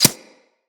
Arrow Impact; Weapons; Bullet Impacts

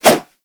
Nunchaku Whoosh; Weapons; Warframe; Tenno

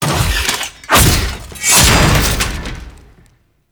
Machete Finisher Stab, Weapons Melee; Moa Finisher Stab; Warframe; Kahl Machete

